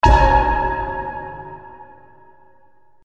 crystal; meepo; chorus

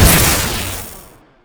unstable current; razor

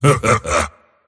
mars laugh; dota

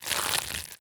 move, movement; medusa

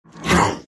shards pain; terrorblade

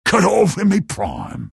death; pudge

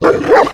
death gnoll, creep deaths; dota

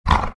grunt, greevil; courier

